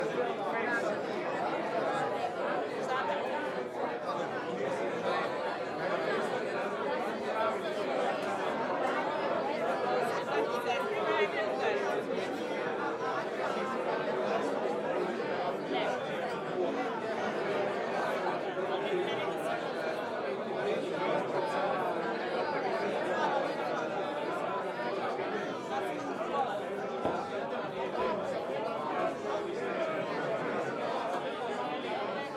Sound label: Bar Crowd In Belgrade